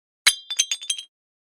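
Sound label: Large Tile Square Pieces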